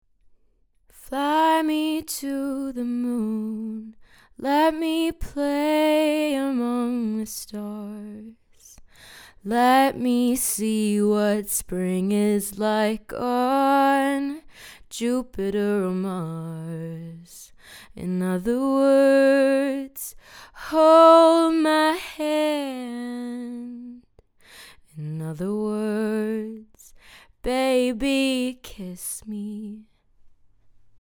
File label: singing; Rode NT1